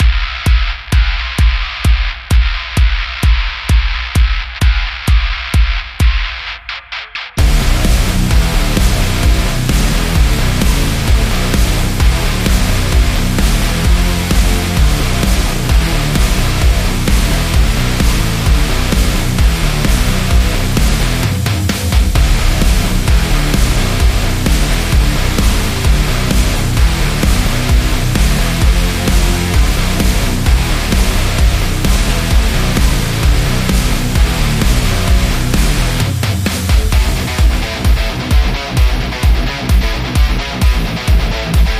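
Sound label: Muscle Car, sport and action music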